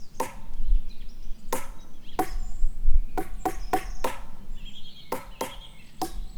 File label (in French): Baton creu Toc serie, PercuBois